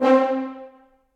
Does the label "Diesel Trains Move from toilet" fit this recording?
No